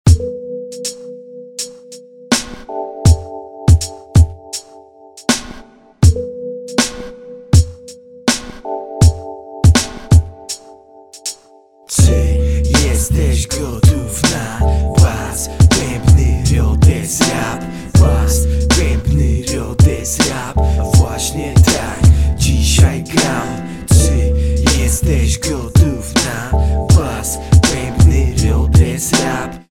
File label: Bas Bebny Rhodes Rap